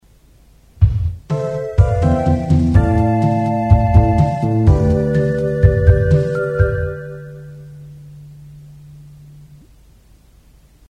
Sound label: Jingle